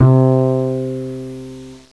bass guitar, string instruments; Sound Effects for Multimedia - Volume 1

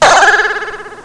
Boing beep; Short Miscellaneous

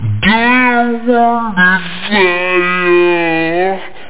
vocal

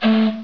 Hoot; Jackson's Alert Sounds

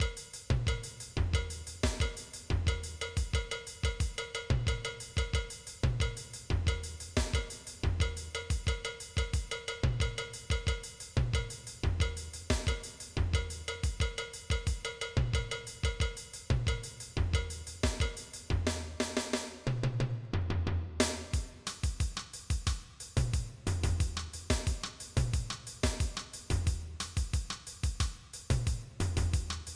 SAMBA

